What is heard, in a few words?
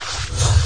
Knockback Shadow